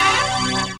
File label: ORG, DANCE ORGAN